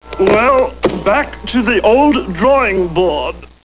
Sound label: death; Alien Arena; marvinmartian